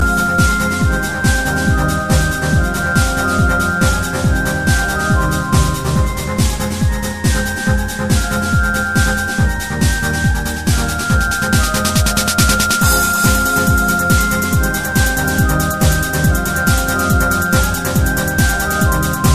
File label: dhol beat mix; alternative